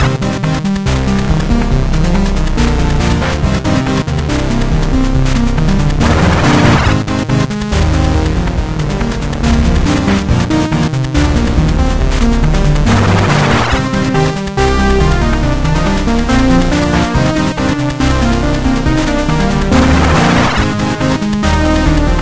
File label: menu; sigurdrshavoc